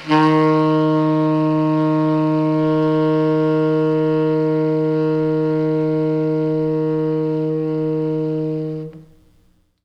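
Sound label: T Sax, tenor sax; B. Webster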